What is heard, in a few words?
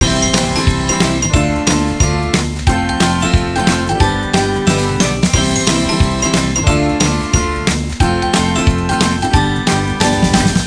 bg cat; Sex Traveler Comic Convention